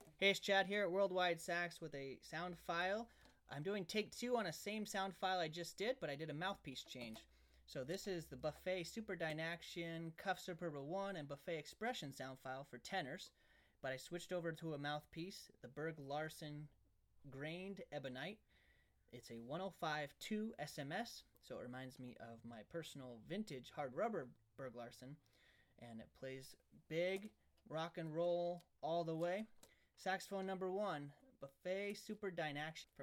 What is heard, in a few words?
Tenors Buffet Super Dynaction Buffet Expression Couf Superba; JK; Brilhart; BergL